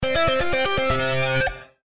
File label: fire fill 120; Adventure Time - Elemental; ThrillGames